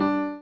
Piano

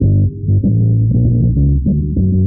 bass, Loops Phonky

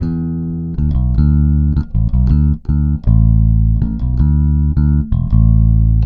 bass, Loops

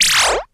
blur; Zaps and Beeps

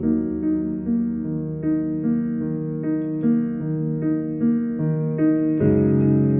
piano, Loops Sad

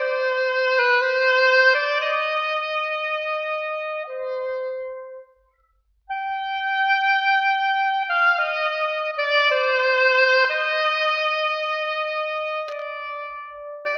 sax, Loops, Sad

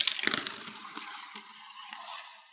marble clacks; Crash Bang Boom

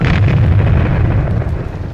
explosion; Crash Bang Boom

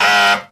wrong answer, zaps and beeps

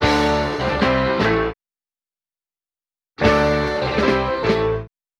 piano horns, Phonky loops

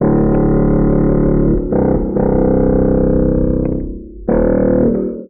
bass, Sad; Loops

